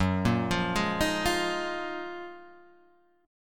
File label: Gb Major 13th